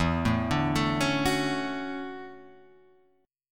E 13th Suspended 4th; 0 0 0 2 2 2; arpeggiated

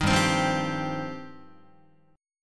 G 7th Suspended 4th Sharp 5th, Guitar

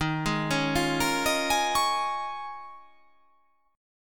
D sharp 7th Suspended 2nd Suspended 4th; D#7sus2sus4 arpeggiated

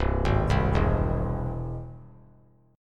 C Augmented down; arpeggiated; JGuitar

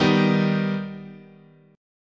D sharp Augmented, chord

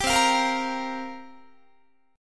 C 7th Suspended 4th, Guitar, chord; C7sus4 strummed

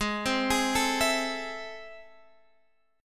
A Minor Major 7th, G sharp

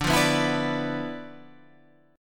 D Minor 6th Add 9th D

